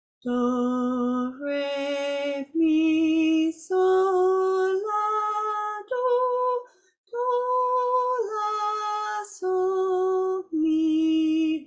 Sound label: Pentatonic Warm up